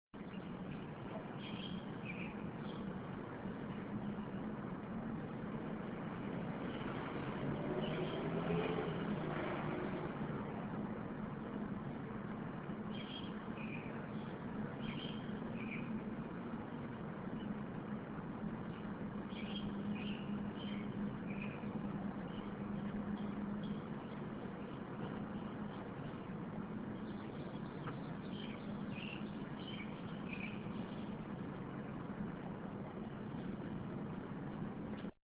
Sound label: Birds